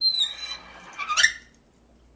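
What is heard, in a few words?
Faucet Handle Squeak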